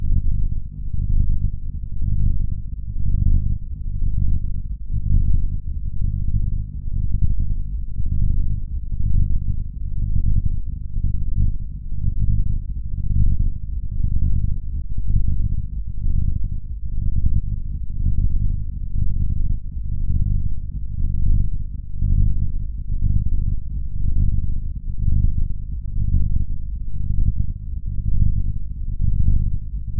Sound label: cat purr; Example scripts for the SAU language, and audio files rendered from them using the saugns